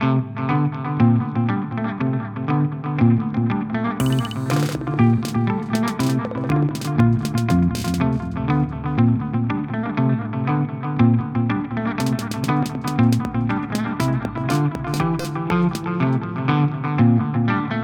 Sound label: MXR Carbon Copy